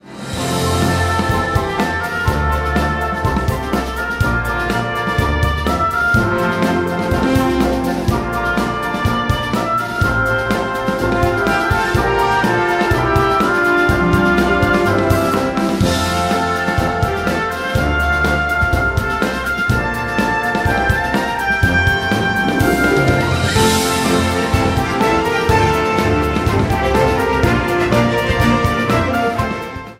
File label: March Caprice; for 'Melody of Memory'